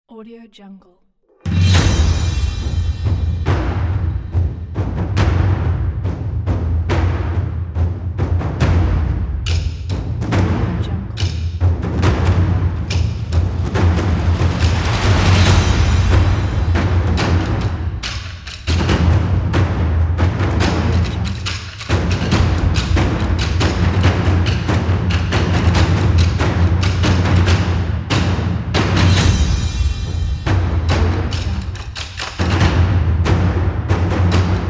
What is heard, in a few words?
Taiko Drums